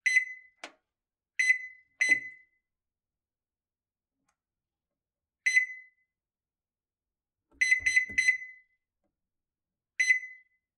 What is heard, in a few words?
Microwave, Panasonic, The Genius, Keypad, Press, Beeps, Various